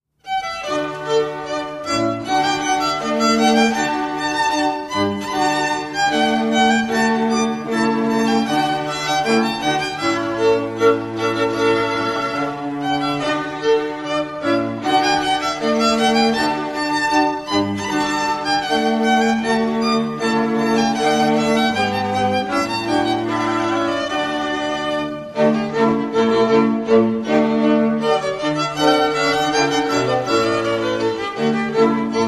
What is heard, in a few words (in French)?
rondeau sinfonies de fanfare; Mouret